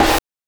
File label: OH; NES DPCM; MIDINES